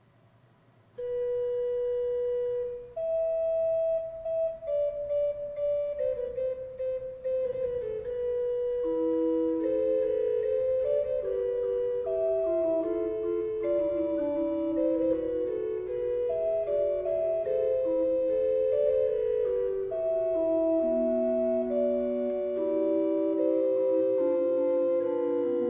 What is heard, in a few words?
Fugue, Organ